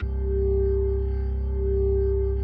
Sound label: C1S, B3